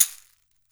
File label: CAXIXI, Brazil Drum Perc; E-MU Producer Series Vol. 7 – Old World Instruments